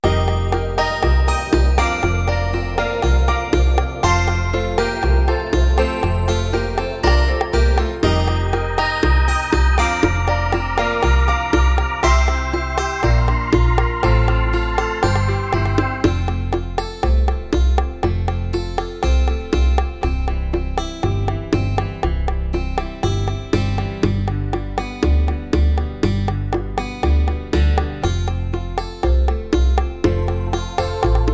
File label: Bachata, rhythms; mzx500; preview pack 01